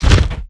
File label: dog footstep run; Garry's Mod